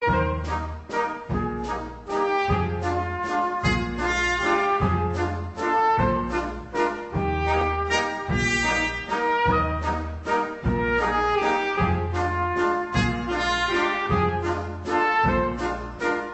trumpeter

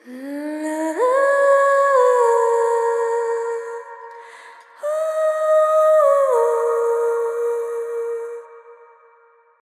Vocal Swell

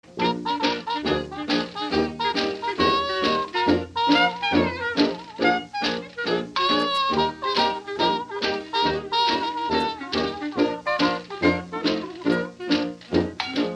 blues

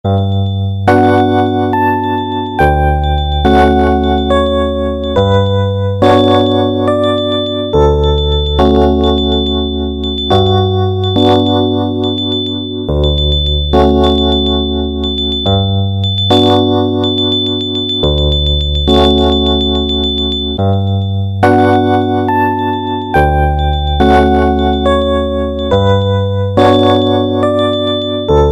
Soft Wake Up Call

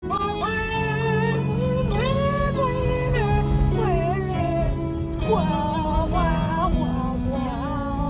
Voice Guitar

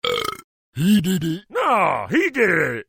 BURP

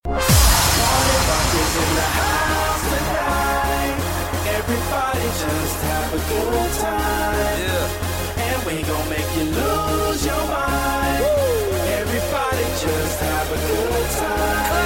Remix

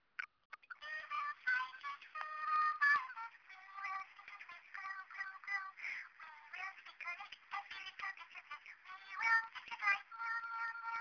Organ

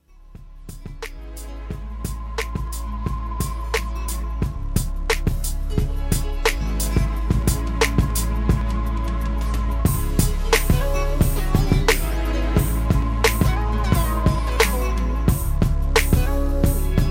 Mellow Beat

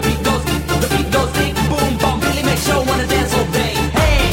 Beat Sms

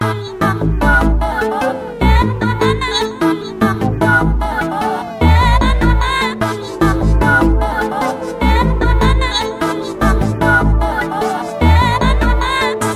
Local Hiphop

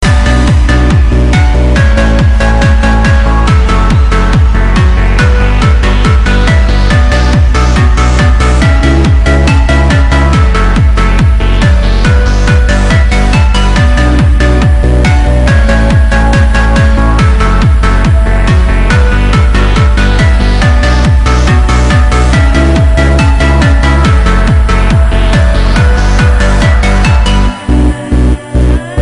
Feeling Trance

